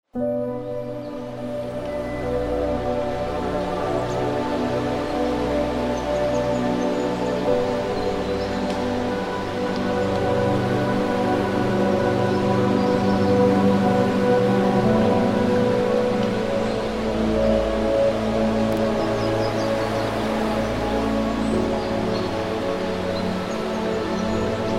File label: Nature Ambience